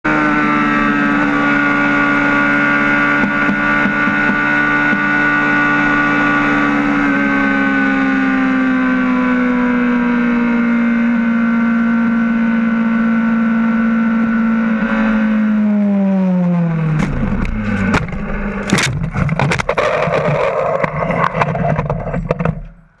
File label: Accident Motorcycle Sound